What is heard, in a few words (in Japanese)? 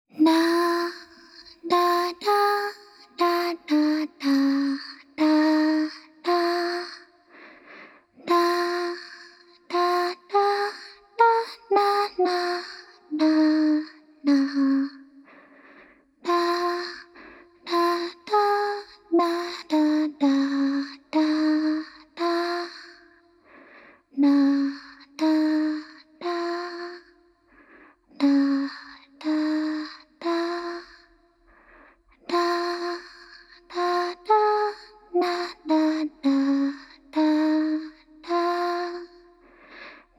【楽曲】 子守唄 リバーブあり